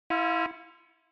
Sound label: Warning Siren, Fast Single